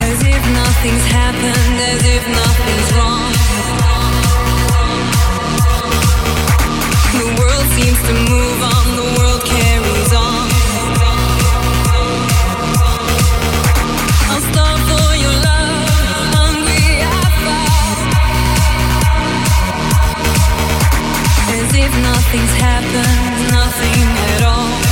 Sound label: trance, ringtones; electronic